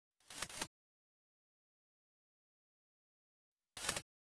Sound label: In Flight Birds